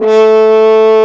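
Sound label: french horn, orchestral ensemble; Roland L-CDX-03 Disk 2; Orch.Ensembl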